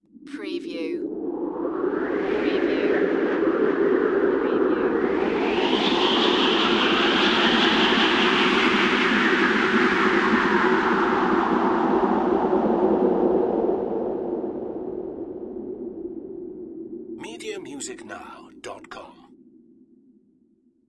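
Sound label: Production Element Ascend; sound-effects-bible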